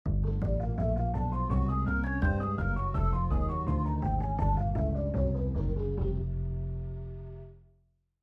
BLUES 12

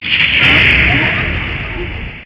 thunder

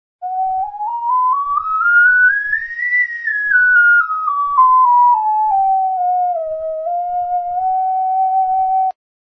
doremi; 音階; オカリーナ; ↑の楽器の音階

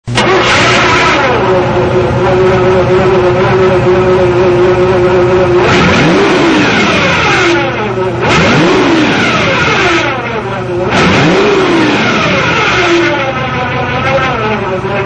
corvette, engine; chevy

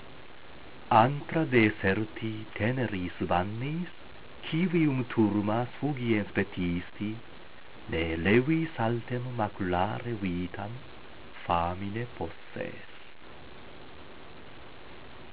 Utqueant, latin

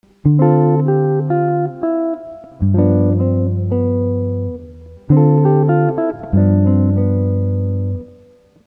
S Guitar

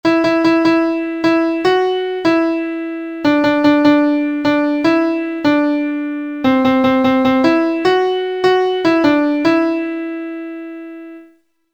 melody

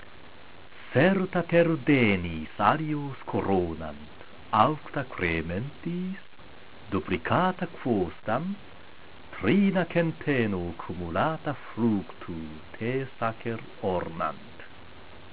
Utqueant, latin

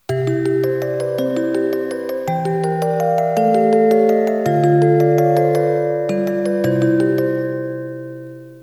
musicbox

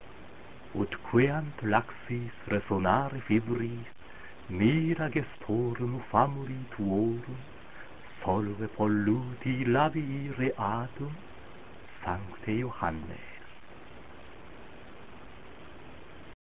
Utqueant, latin

